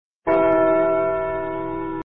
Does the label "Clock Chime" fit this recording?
Yes